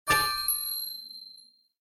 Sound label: SFX Roulette Wildcard